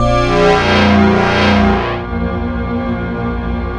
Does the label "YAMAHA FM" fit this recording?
Yes